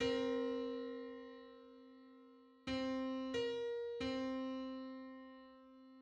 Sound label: Two-hundred-thirty-first harmonic on C; MIDI